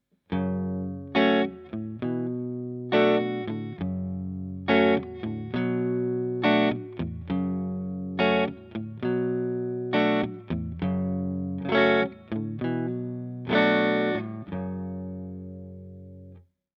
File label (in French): bogner clair sans rien manche; bogner alchemist